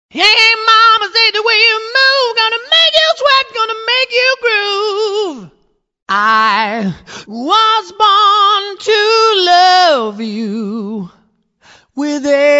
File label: song